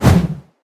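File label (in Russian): взмах разрубающий воздух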